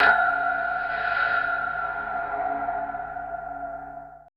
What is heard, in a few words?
AMBI FX